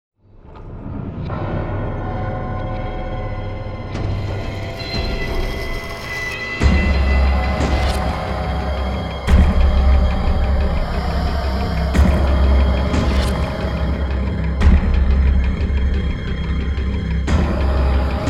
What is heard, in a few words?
Dark Vocalize, Ambiance, Jungle; Foundry; FoundryVTT; sr5 Dawn Of The Artefact